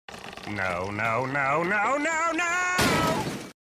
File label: brown noise loopable with no fade